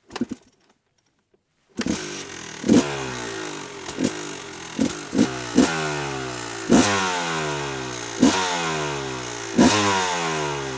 KTM EXC 650

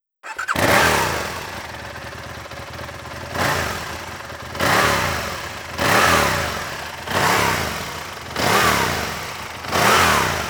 Aprilla RSV Mille

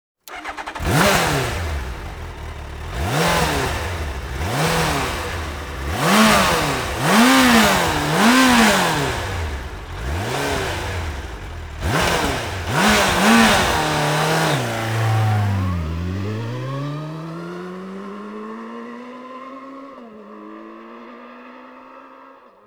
Suzuki GSX 1300 R Hayabusa

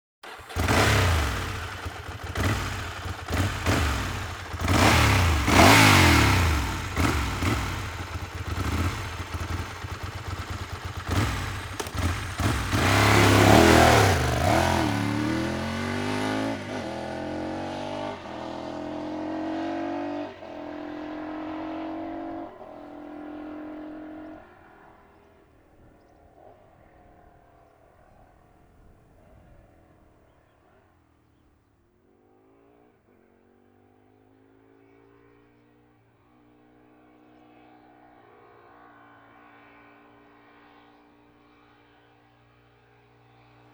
Ducati 748

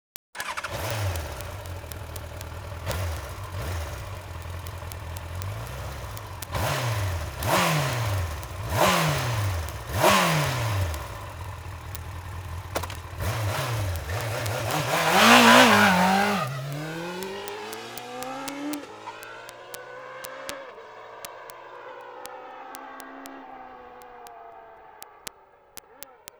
Yamaha YZF R1

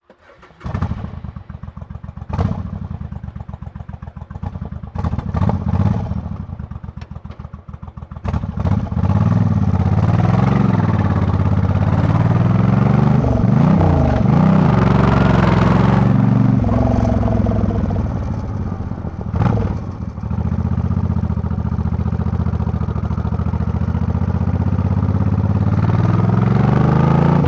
Suzuki VL 1500 Intruder (On the road)

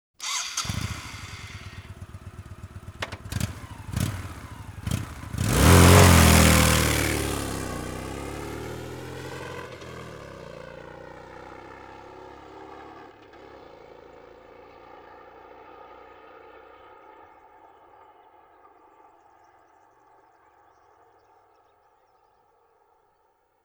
Honda VT 1100 Shadow

